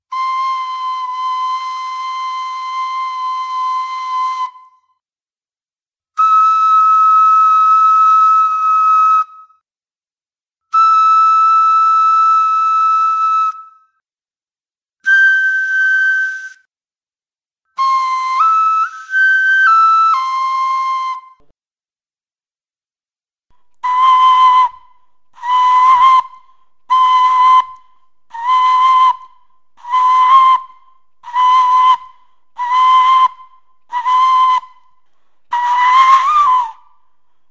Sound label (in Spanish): flauta globular